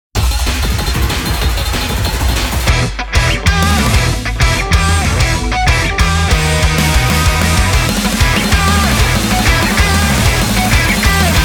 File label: inst